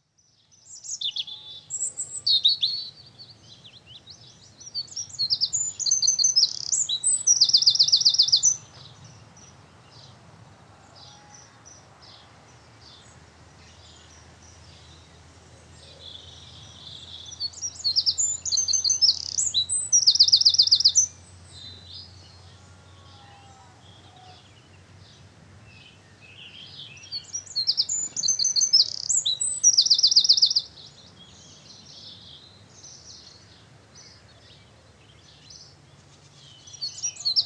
Farmstead Late Spring, Early Mor, Rural Soundscapes; BBC Sound Effects Library